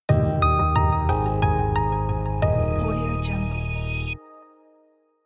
brand logo opening; soundseeds

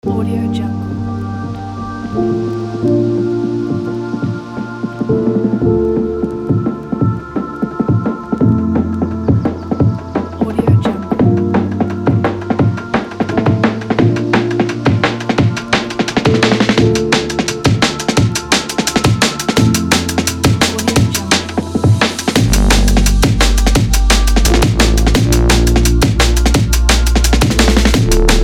inspirational dnb; hexagonaudio

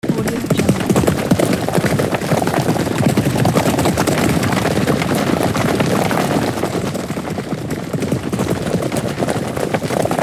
horse gallop race

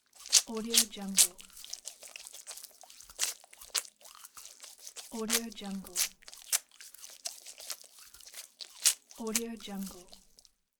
washing soapy hands; applehillstudios

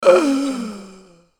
dying man last breath

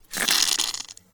foley gore squish spurt spray squirt; leak blood

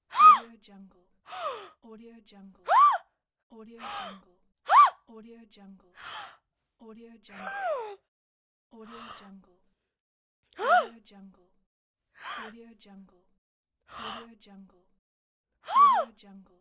female gasps expressions

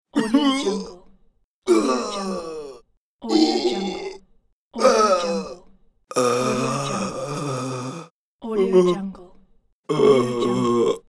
dying man groans

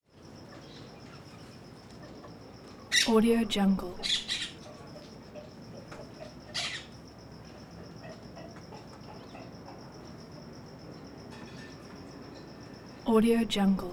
bird ambience; prosoundfx

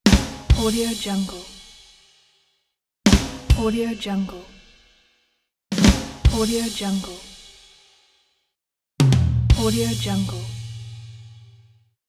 drum roll after a joke

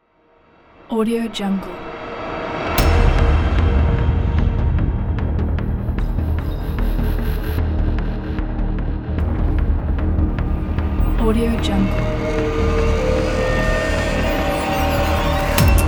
dark electronic dubstep metal; apd sound

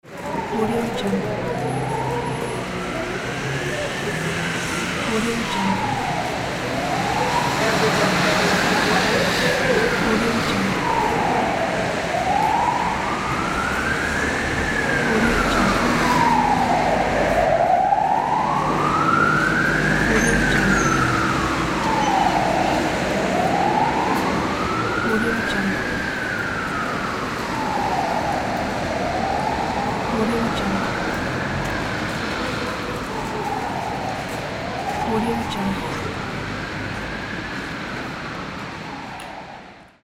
ambulance on the city street

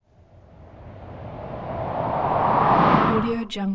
whoosh suction

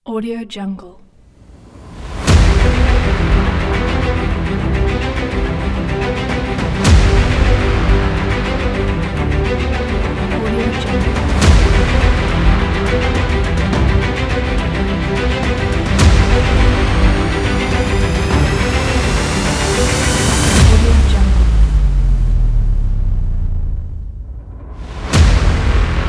epic trailer logo

